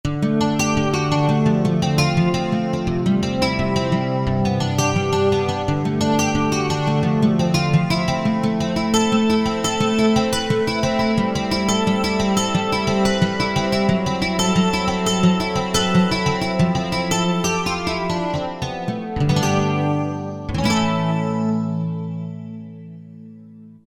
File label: Gittar